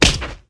Wrecker Hit; Farm Mania

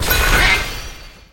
Plate Success; Monster Hunter Frontier Z Zenith; PS Vita; PS3

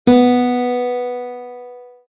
Guitar Note

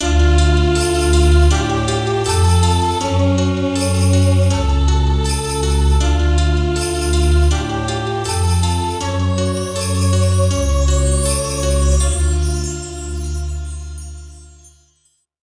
Jingle Gameover; Azito 3D; 3DS

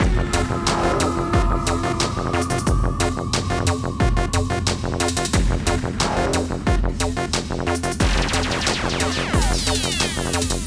Endless Melodic Long; Horatio Goes Snowboarding; PS4 PS5 Switch Windows Xbox One Xbox Series X/S

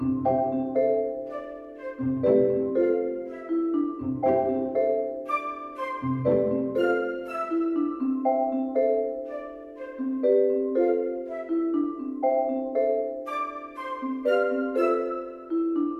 Loop 120bpm; jenny leclue detectiv

